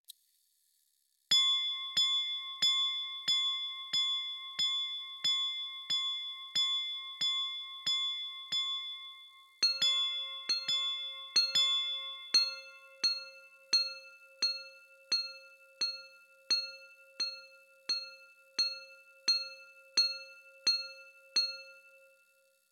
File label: Minute Repeater; A Lange Sohne Richard Lange